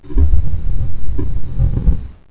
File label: eye die